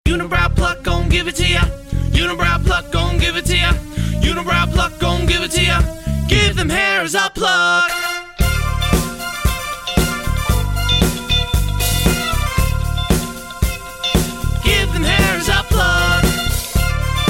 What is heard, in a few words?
Pluck